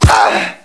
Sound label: bhit flesh